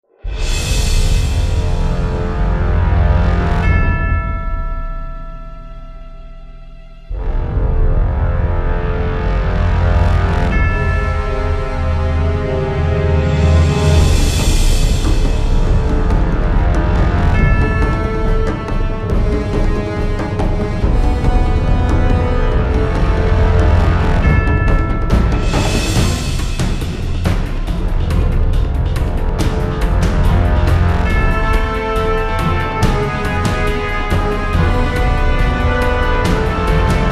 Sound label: orchestral